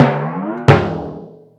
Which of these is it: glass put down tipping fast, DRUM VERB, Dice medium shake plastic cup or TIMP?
TIMP